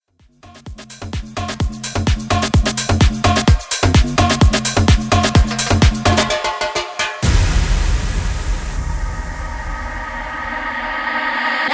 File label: Fogo Bootleg Tribal